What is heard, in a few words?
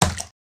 slime; water splash slime